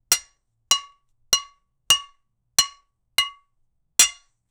stick hits, physical

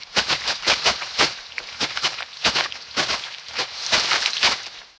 flag waving; atmospheric

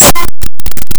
a single soft prayer bead; meditative

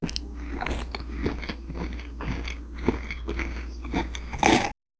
eating pizza on the couch

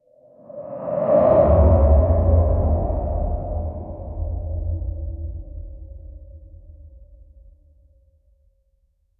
pressurized underwater, deep water

